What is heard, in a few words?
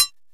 KLANK, TECHNO INDUS; Greytsounds VOL-1